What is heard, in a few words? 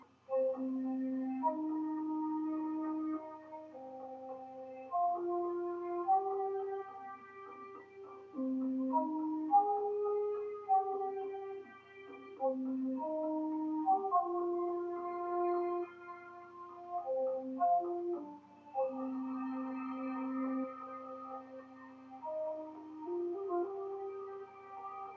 Cherokee Fiddle